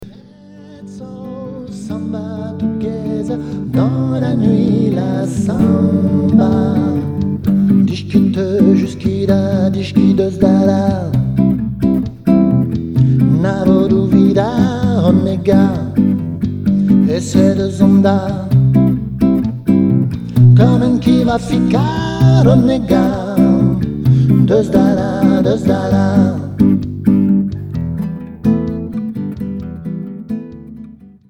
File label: Partido alto